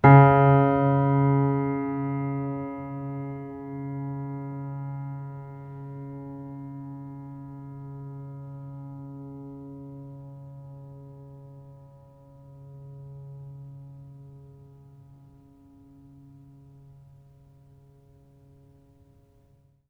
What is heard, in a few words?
Note, Piano; Piano Miscellaneous